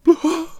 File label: Healer Attack Vocals